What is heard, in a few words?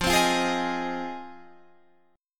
F Minor 7th F Guitar Standard; Fm7; 13,15,15,13,16,16; strummed; capo=2